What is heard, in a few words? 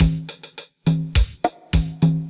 Roland D 20 Rhumba 104 bpm